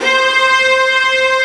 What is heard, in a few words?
VIOLIN C3